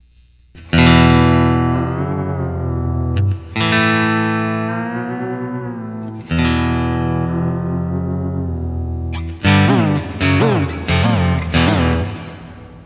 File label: Pedal Bend, Boss GT-8